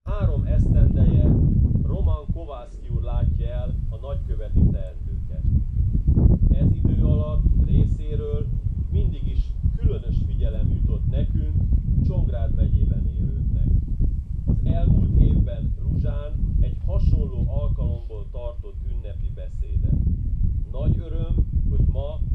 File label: digitar